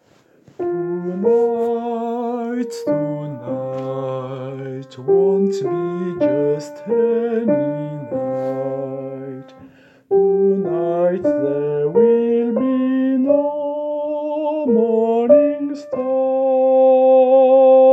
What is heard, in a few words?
soprano